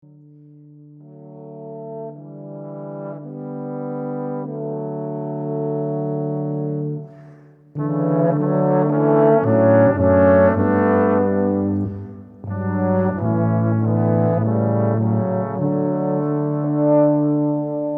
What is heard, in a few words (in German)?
BRASSQUINTETT